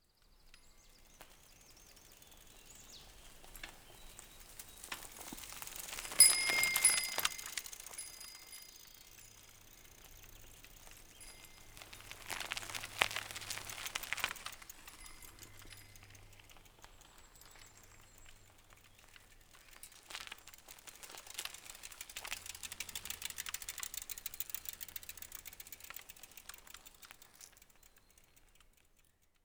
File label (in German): Fahrrad